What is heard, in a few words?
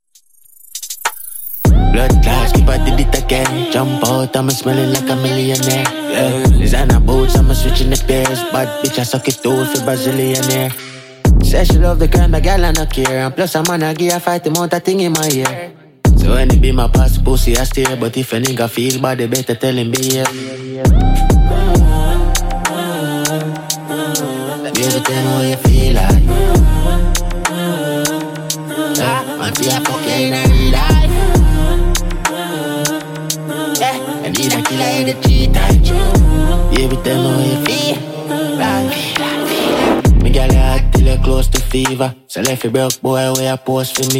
Dj Intro Outro 100bpm; remixplanet